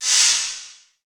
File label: Shake Wind; FREE SFX PACK VOL 2